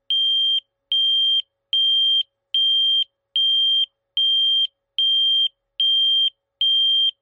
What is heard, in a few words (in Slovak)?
modul signalizácie cúvania 105dB 12 60V